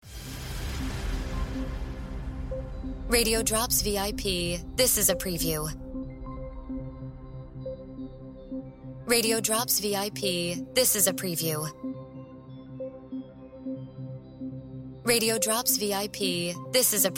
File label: Music Beds